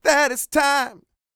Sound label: SING, Vocal Planet R&B & Dance; ILIO